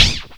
ZAP, Hip Hop Misc Perc; E-MU Producer Series Vol. 8 – Platinum Phatt

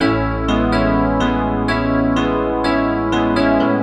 E PIANO 125, Keys; Houseworx

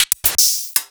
Hi Hats

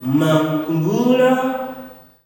"MUM", MALE; Spectrasonics; Heart of Africa 2; S.MALEAMB

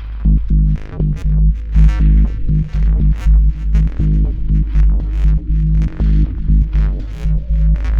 120 Eerie Dub Perc, Drum Loops; 120bpm; Nu Dance Electro

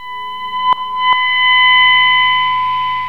MEOW WAH, Distortion Gtr; PlatinumPhattCD2; E-MU Producer Series Vol. 8 – Platinum Phatt

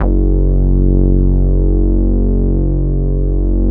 PL MOOG; Wizoo - Powered Nord; STEREO LAYER

